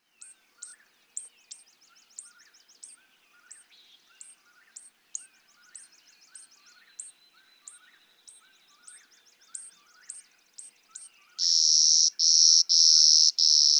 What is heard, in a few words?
Clay-colored Sparrow